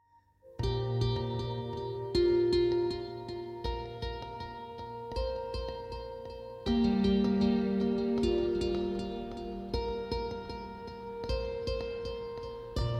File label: Zen of Harp; Spa